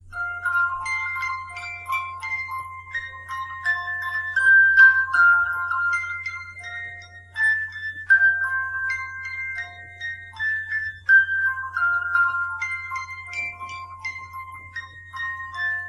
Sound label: Twinkle Twinkle Little Star